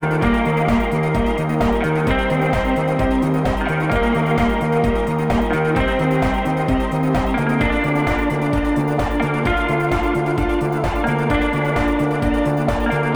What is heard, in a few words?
Protorave Short